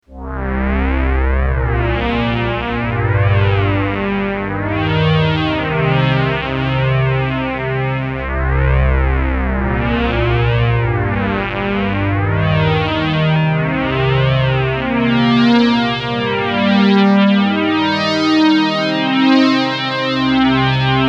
JU-06 pad

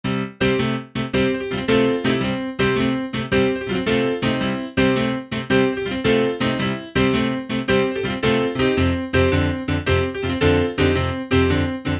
Piano DRY; SFZ GM FLUID3

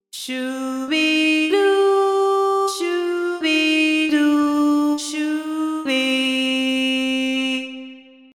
phrase mode

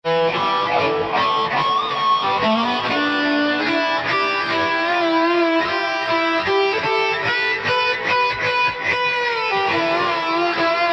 Lead SLOWER; RiffStation

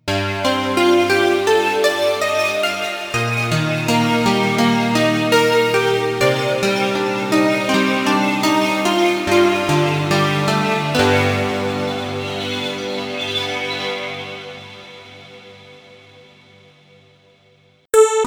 Keys

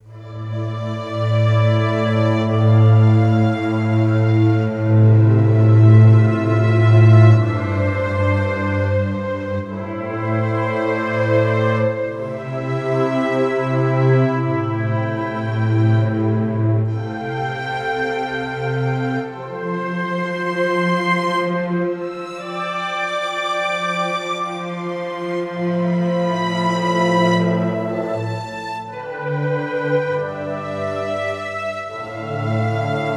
Orchestra; Albion V

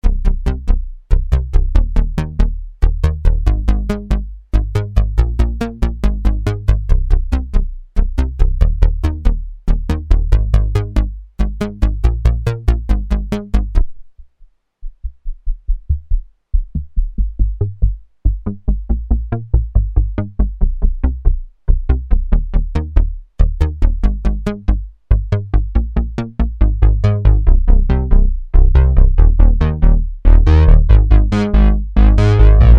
JU-06 bass

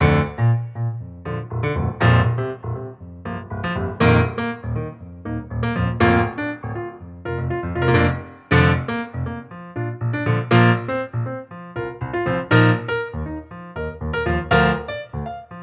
Grand; EZKeys

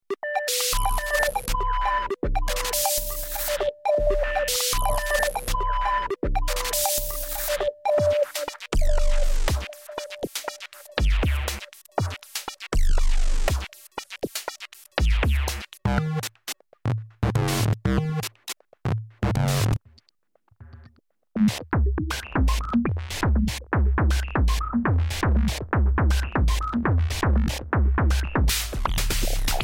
Sine Beats

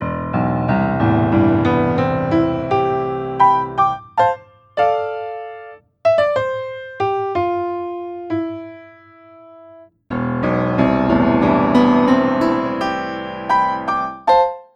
Upr Piano hardn; EZKeys; PianoV

